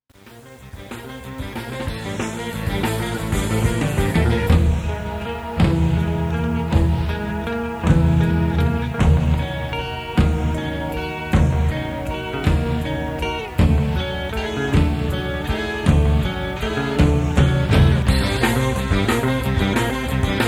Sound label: 7 beat